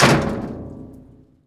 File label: metal door close